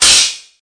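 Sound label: hi-hat open, Volca; drums full kits; style kits; WallsVado Volca Sample Pack; Hip Hop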